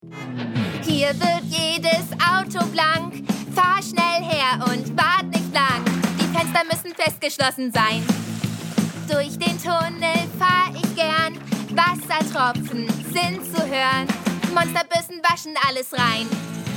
Gesang; Gesang schnell; Esme & Roy

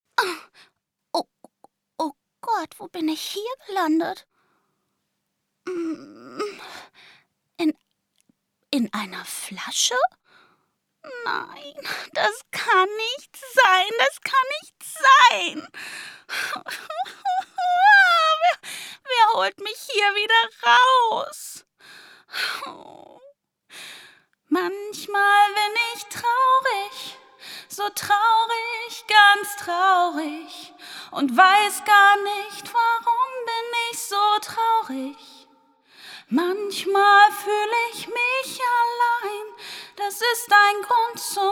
Zeichentrick Gesang hall

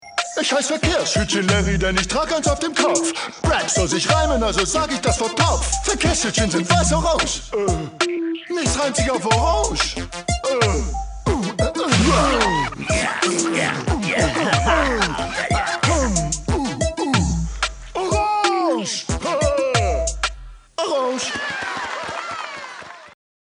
Synchron Anime RAP Song; Larry

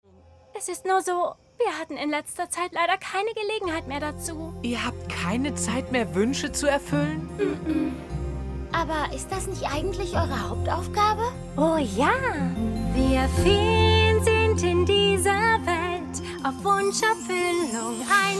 Gesang; Dora And Friends

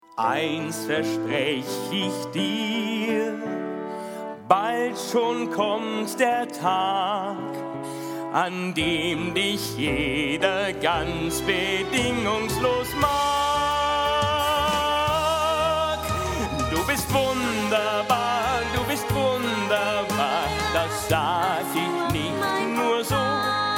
Musical Gesang

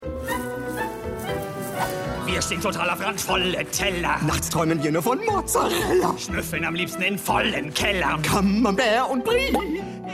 Rattensong

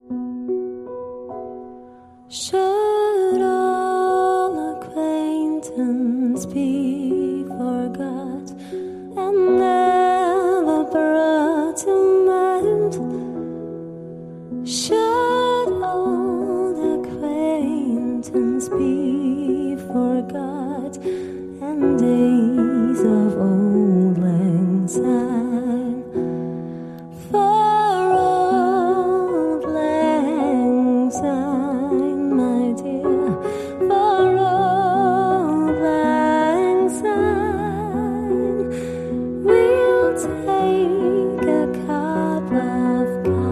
Gesang soft

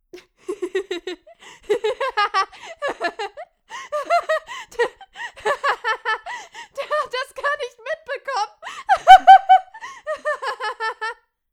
Lachen

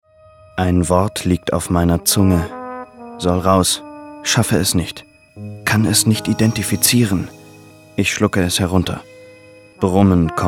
Brummen; Lyrik; WDR

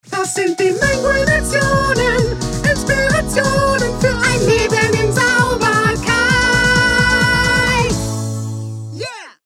GESANG FALSETT

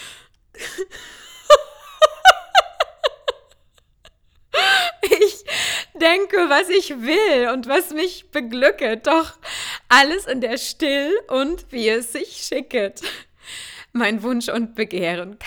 Lachen

